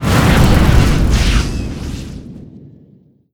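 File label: explosion pipe burst decay; Explosions; Call of Duty Modern Warfare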